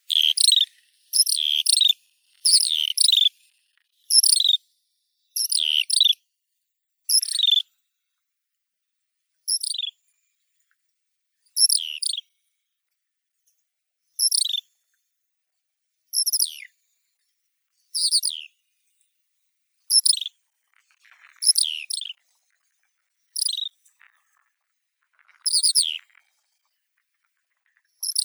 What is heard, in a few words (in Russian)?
zhavoronok solonchakovii calandrella cheleensis, zvuki prirodi